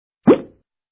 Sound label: pop